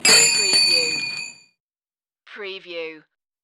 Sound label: Bell Hotel Desk